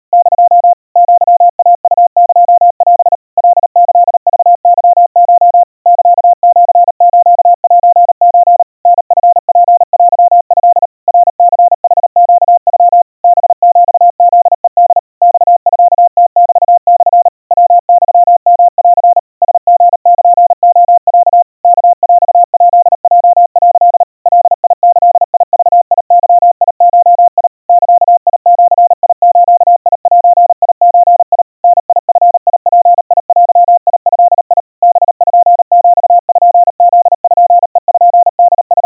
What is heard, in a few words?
aiueo 130 cpm 700 Hz Crispy, Morse